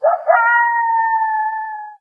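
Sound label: rural random coyote; random amb sfx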